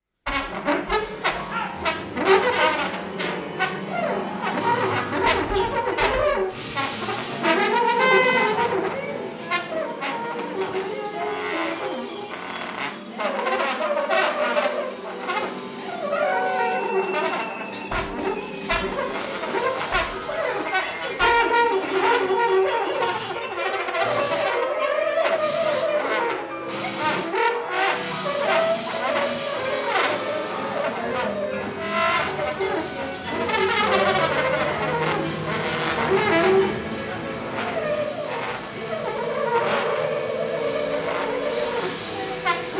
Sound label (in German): Tutti kurz